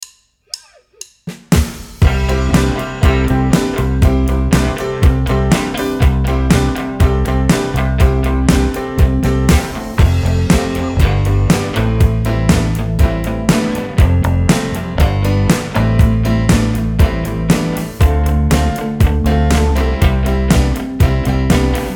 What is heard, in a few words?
instrumental 120bpm; Popkantor Band; 1jahr12songs; kirchenmusik; popularmusik